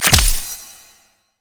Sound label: pick a card gold card; LoL; twistedfate